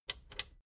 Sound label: plastic button small latch click disable slow